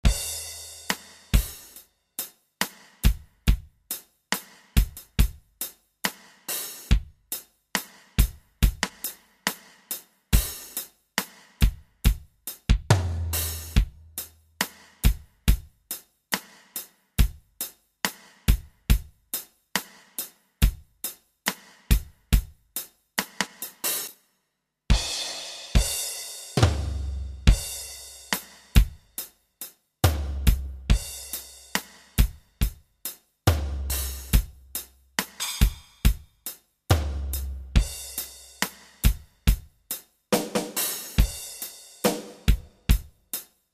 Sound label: Pop Ballade